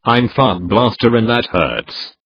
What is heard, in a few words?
pain; openffa; fartblaster